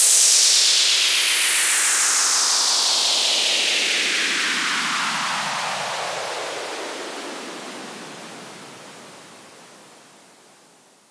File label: SFX Down, Sweep Down; Ghosthack